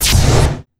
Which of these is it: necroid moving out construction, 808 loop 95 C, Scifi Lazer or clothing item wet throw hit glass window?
Scifi Lazer